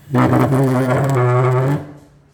Chair

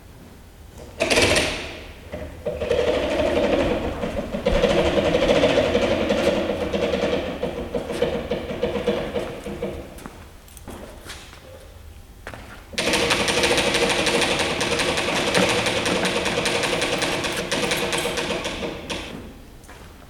Rope lowering

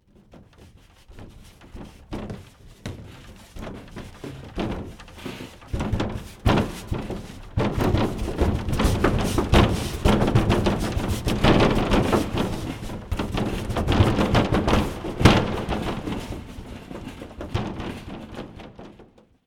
Rolling Wardrobe With Knocks Inside

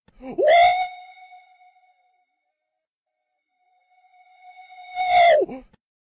Woo Noise

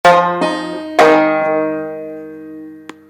Banjo notes

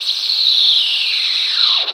Audio Cassette Screech

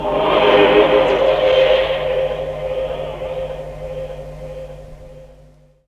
Wobble Choir

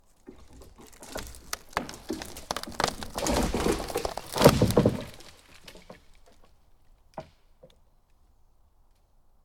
Pine Tree Falling

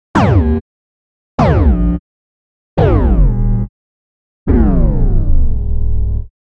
Sounds A Bit Like Error

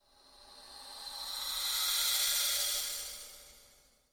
Creepy Room Sound

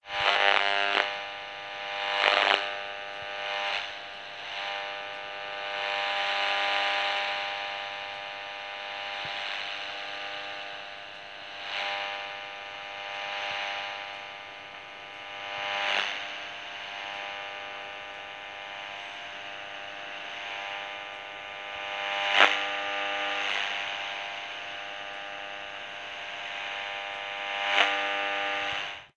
Walkie Talkie Noise

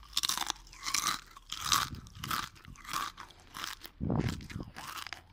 Chips

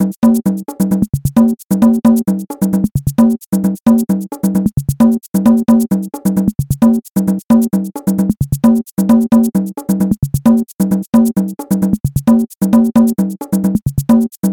Percussion loop

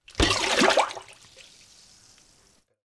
Object Falls Into The Water

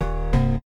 Wrong Answer Incorrect Error

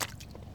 Water Step

